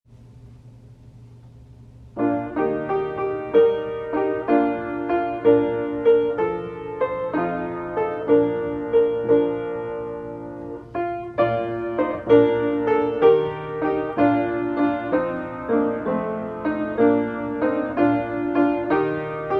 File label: The Handcart Song accomp